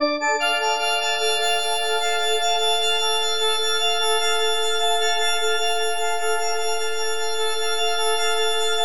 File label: FM D MIN; Bolder Sounds